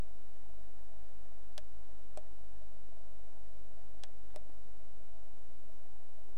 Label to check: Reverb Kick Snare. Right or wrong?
Wrong